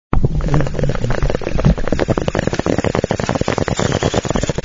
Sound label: Bong Hit